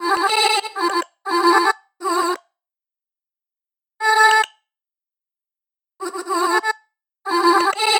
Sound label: Glitch Chops